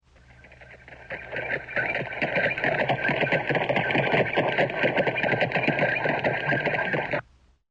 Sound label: Stagecoach; SOUND EFFECTS1